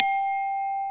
GLOCKENSP; Back In Time Records; Big Bang

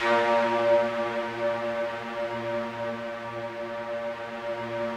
FOG ATTACK, Yamaha VP-1; Music Store; FOGATTACK 3

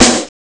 desert snare; Amiga Soundtracker Sample Packs

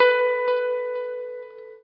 NOODLE LONG, Guitar & Bass

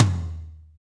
TOM; Best Service Gigapack 2

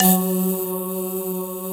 SYN K-2000, Roland

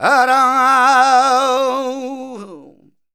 voc c, Flamenco Vocals Male Cantaor

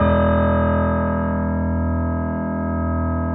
E 0, Keyboards Acoustic, GRANDP ST; USB Soundscan vol.17

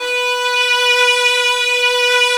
VIOLA; 3D Sound - 3D Keys VOL-1

